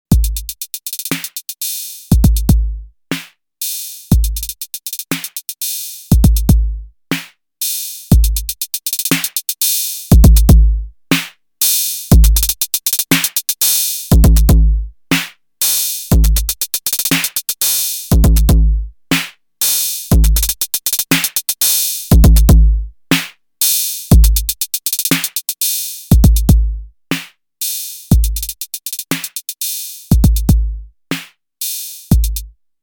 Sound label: Arturia DrumBrute Impact Pattern